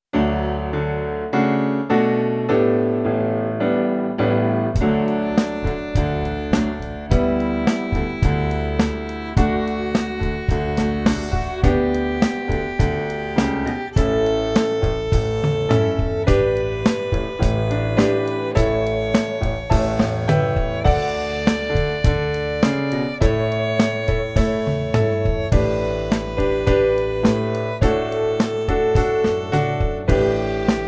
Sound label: Scale Of D Major 4/4